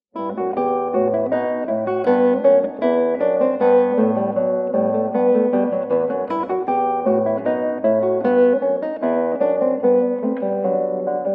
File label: Bouree E minor